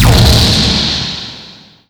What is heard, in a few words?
Boss Attack; Pac-Man Championship Edition 2 Plus